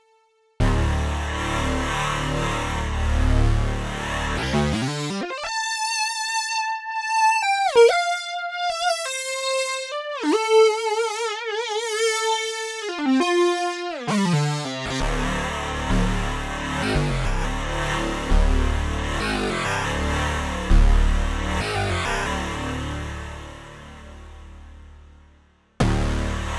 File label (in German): Leadpreset; Arturia V Collection 9; Prophet VS